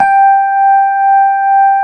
SUITCASE, Keyboards Old School

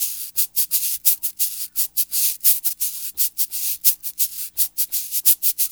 CABASA; East West; Funky Ass Loops

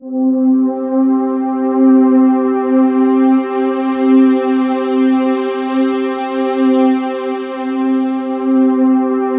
C4, Synth Leads

